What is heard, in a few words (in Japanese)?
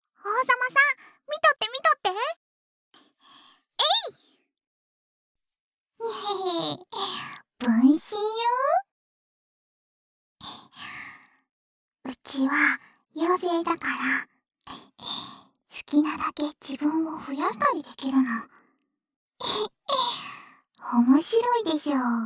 ちろちろ乳首舐め＆ねっとり耳なめ, ASMR